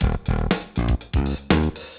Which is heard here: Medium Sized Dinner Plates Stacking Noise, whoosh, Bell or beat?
beat